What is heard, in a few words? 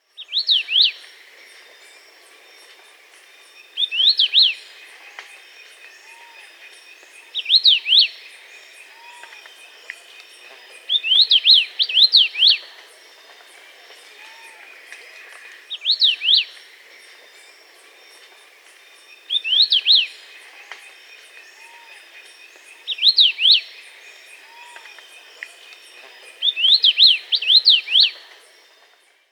Dusky-capped Greenlet (Hylophilus hypoxanthus), Peru, Birds of the Amazon; S. America